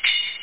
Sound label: tambor, drums cymbals; OctaMED SoundStudio V1